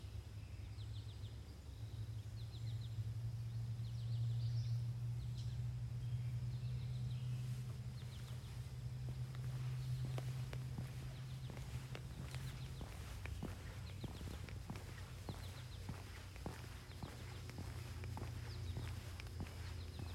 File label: Acadian Flycatcher, Empidonax virescens; Vermont